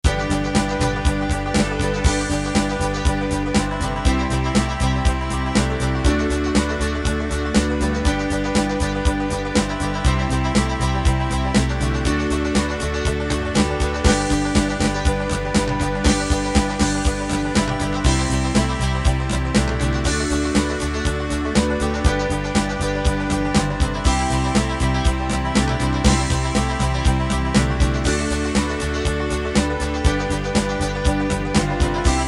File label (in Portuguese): instrumental